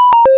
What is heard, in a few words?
ui ptt call drop, Motorola ROKR EM35; ui sounds